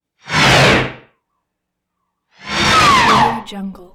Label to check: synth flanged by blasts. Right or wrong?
Right